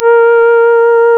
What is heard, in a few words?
Synclav FM, WIND, A#; Big Fish Audio